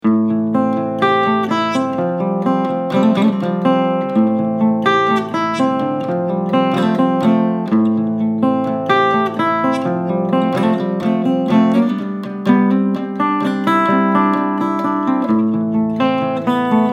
Picking Am

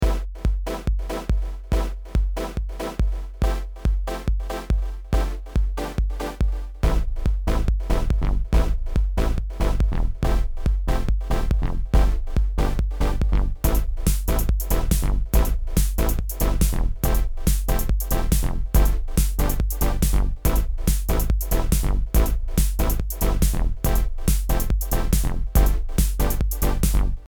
Reason Test Loop

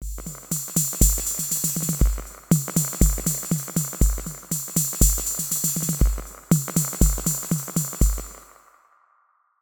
Ableton Echo; Hallow Trip

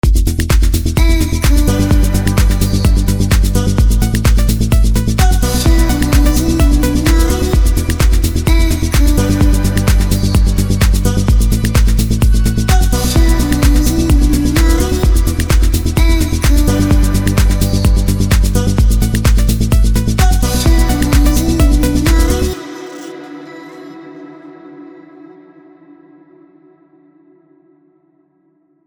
Melodic Tech Voices; Vengeance Sound Avenger 2; SQ Echoes

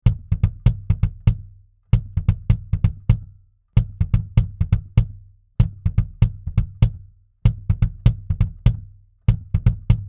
Preamp BD 30 dB 1200 Ohm; 1073 Preamp Vergleich; Warm Audio

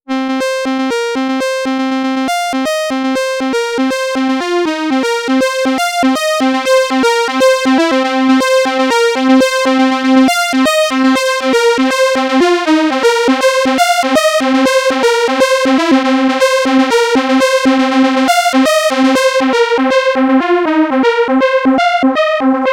3 VCOs Lead

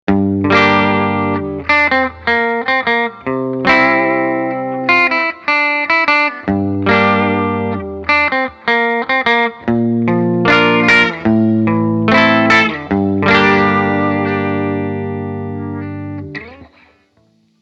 Gretsch G6129 Bridge clean

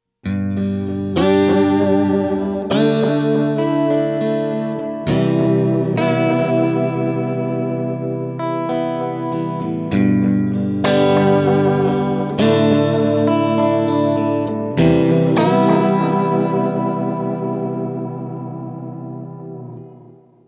Strumming; Immortal

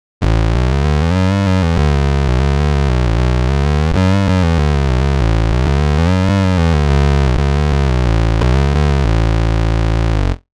Bass Synth; Neural DSP Archetype Rabea; Overlord

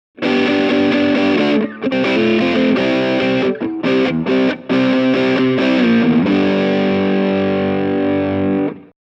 dist mid

